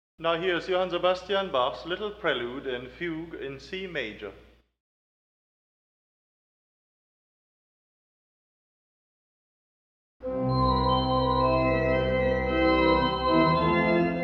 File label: Little Prelude and Fugue in C Major Prelude; Bach